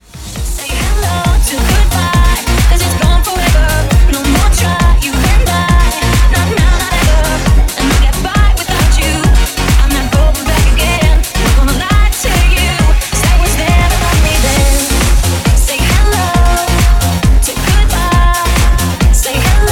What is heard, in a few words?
funk; powermusic